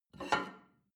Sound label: plate take table slide hard; berufe; soundpool